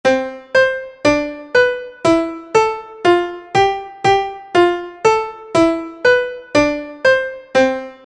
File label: Piano